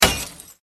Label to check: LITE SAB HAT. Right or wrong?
Wrong